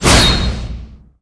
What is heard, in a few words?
Weapons Swing